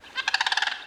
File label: crane; ambient; anubis